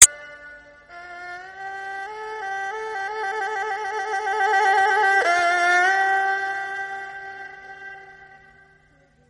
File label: classical chinese ringtone